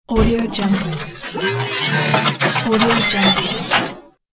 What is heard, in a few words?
robot transformation